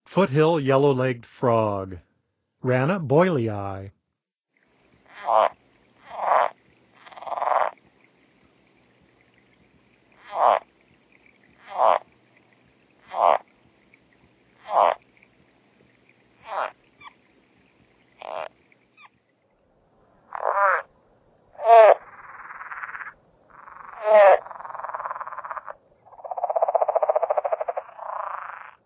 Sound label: Foothill Yellow-legged Frog; North America; Songs of Frogs & Toads of North America